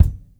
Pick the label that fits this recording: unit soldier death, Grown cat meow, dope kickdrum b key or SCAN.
dope kickdrum b key